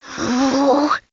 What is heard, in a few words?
ohhh; flora